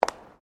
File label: FOOTSTEPS WOMENS HIGH HEELS PAVERS STEP